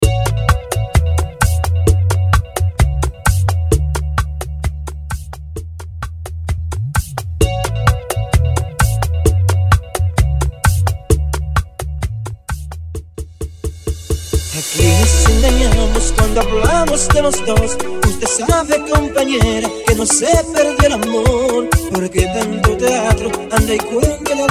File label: reloaded 130 BPM